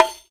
Rek Tak, Roland BK-5 Percussion

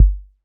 kick machine

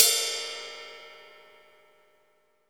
RIDE RIM, Ride Cymbal; RIDE RIM MIX; MONO RIDE CYMBAL 3

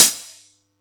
Yamaha EX-5

